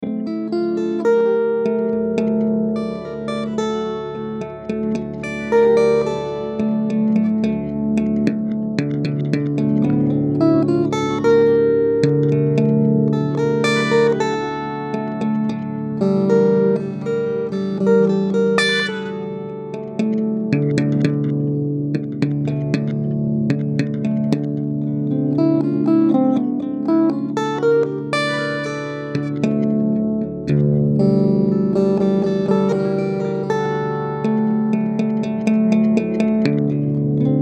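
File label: Musical Element, Drum Snare March; Blastwave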